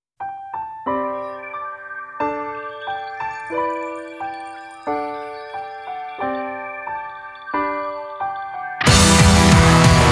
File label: instrumental